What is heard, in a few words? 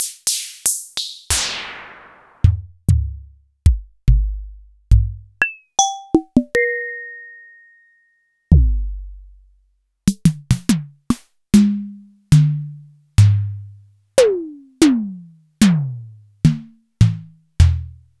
Clavia Micro Modular